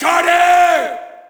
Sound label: Shout, audience; UFC Tapout 2